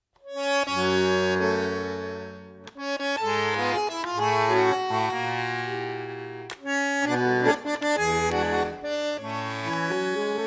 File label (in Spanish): Tango progresivo, Vals